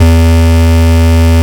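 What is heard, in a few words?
bass distortion E2; LIMAT LSDJ kit; LSDJ Extra Bass Notes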